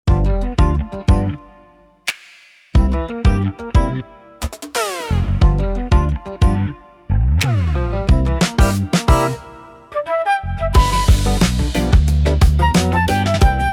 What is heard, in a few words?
Street Groove; opener